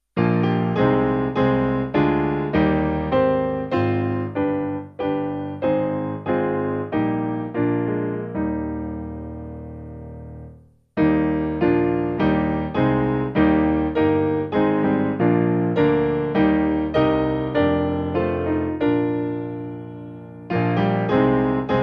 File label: Piano